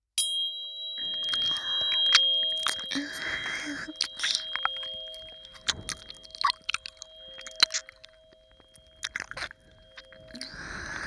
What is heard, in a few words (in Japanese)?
音叉 ＋ 耳なめ; エンドレスb